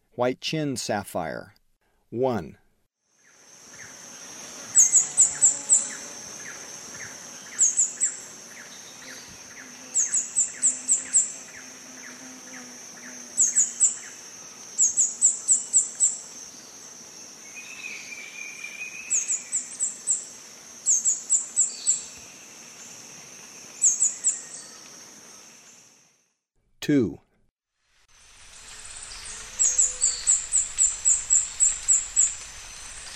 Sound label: White-chinned Sapphire; S. America; Eastern Lowlands